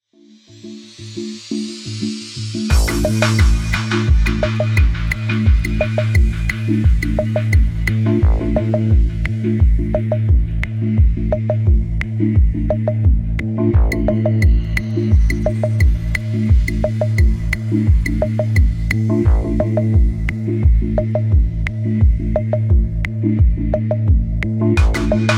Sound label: slow grooves